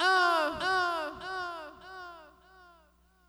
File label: ah